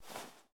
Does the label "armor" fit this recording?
Yes